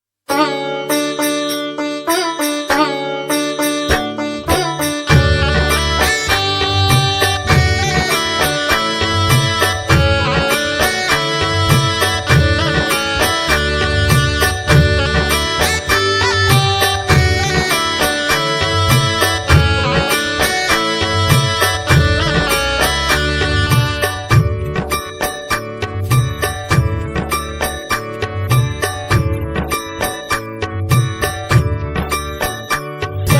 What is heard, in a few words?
instrumental ringtone